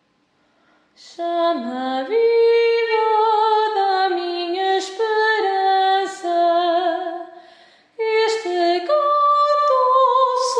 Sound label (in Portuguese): Hino Jubileu Refrao Soprano